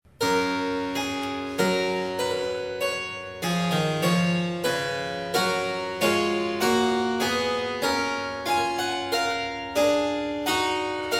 Goldberg Variations, Variatio 9 Canone alla Terza. a 1. Clav; J.S.Bach; BWV 988